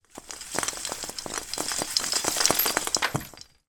household christmas tree fake shake baubles decorations wobble fall off; zapsplat